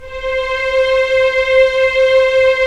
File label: STR Violins, Roland LA Composer; Roland - LA Composer vol. 4